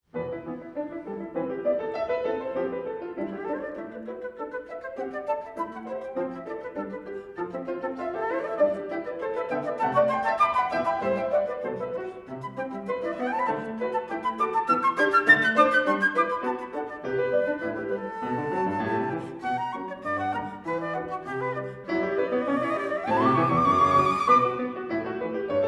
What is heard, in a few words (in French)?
joueurs de flute, duo; roussel